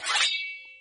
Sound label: slime; lentillesvisio